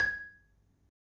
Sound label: m G#4, Marimba; Misc; Terkelsen